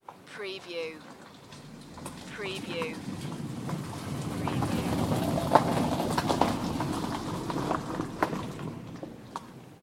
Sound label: Car drive by on gravel; beanstalk audio